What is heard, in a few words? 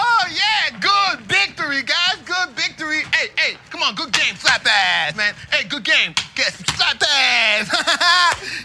slap ass